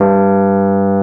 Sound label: AMB ROAD, Keyboards Old School